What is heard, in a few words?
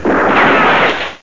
Old western Gun